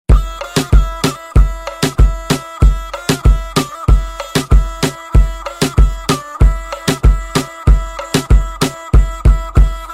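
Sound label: Instrumental Tone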